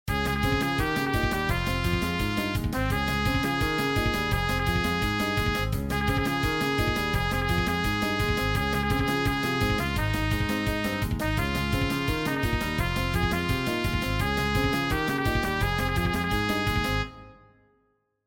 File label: instr